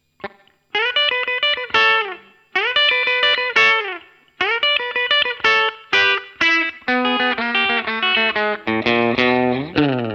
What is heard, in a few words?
Hot Rails clean; SDS